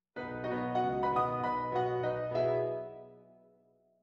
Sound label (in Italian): Allegro